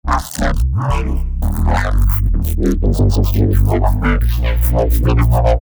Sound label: 172 C Synth Bass